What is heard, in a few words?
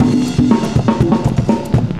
cooling 120, loops, 120bpm